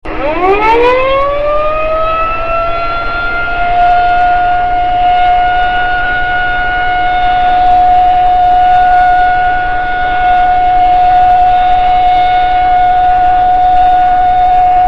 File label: Tornado Warning, sirens; Dupree 2001